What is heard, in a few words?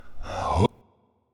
SFX argh; wickerman